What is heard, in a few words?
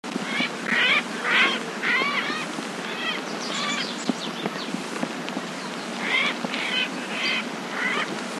Ara rubrogenys